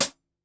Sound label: swung HH; Basement drums vol 2